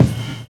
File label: live kick; Univers Sons - Kitch 70s